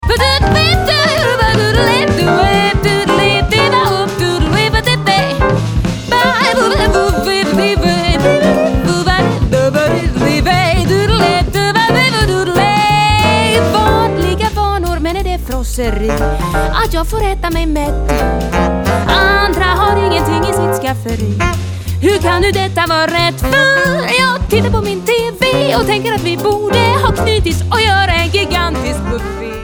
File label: jazz